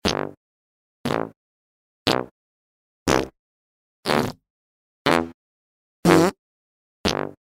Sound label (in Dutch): muzieknoten fragment; efteling